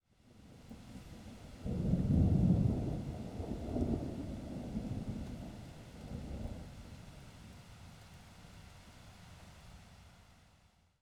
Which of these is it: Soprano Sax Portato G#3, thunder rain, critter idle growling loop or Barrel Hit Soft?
thunder rain